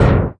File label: WHITE STOM, PERC; NorthStar - The Wizard VOL-2; TSAR PERC